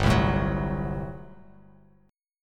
G Major 7th Suspended 2nd Suspended 4th G down Bass Standard; GM7sus2sus4 strummed